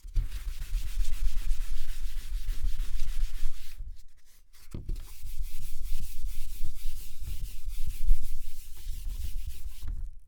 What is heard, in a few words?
Erasing Whiteboard